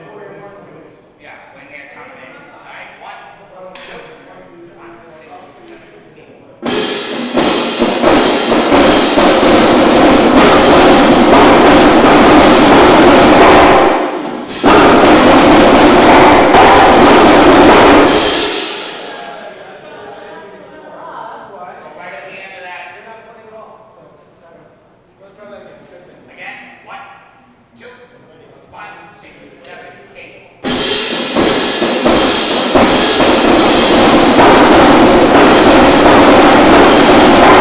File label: Celebration Drum Intro, brass and ensemble